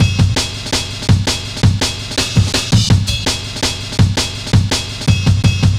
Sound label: Samurai, Drum Loops, 165.5, Jungle; Zero-G Jungle Warfare 1, 2 & 3